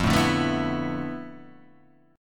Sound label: D Minor 6th Add 9th F, guitar chord